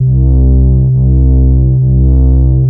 C3, BASS; Q Up Arts; Dream Experience; BASS BANK 5

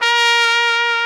SOLI TRP, Alpine Volksmusik, TROMPETEN; Best Service

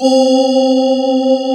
SPACE VOICE, SC55 VOX; East West - Global Sounds

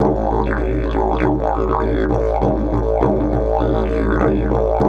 DIDGE 100BPM

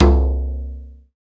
DUMBEK; Roland - LA Composer vol. 1

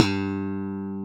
CHOP G1, bass; AKAI S6000 CD-ROM - Ultimate

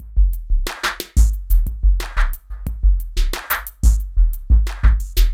Gated Beat (90BPM); musicradar

